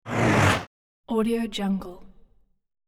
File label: vehicle zip by; hollywoodedge